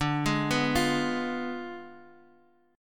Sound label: D Diminished 7th Guitar Open D, chord